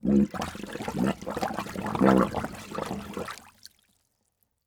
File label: Liquid Water Water Bubbling With Tube Noise